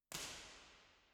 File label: First IR Sala 2800 Dodec Center XZ, Soundfield; Santa Cecilia Roma; Measurements 2005